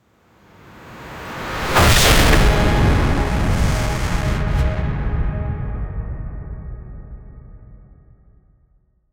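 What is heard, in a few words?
cinematic war, artillery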